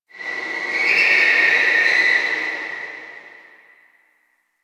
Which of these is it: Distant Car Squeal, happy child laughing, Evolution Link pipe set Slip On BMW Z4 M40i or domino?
Distant Car Squeal